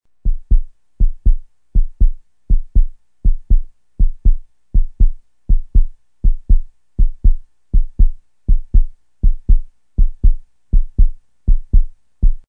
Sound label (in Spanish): Latido corazon